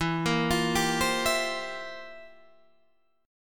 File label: C 11th E